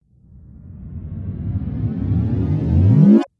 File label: Dance Riser